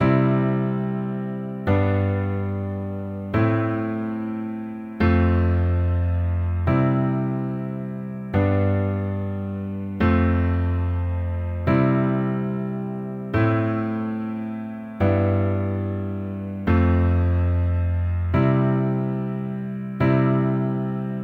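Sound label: position mix with left hand, accompaniment both hands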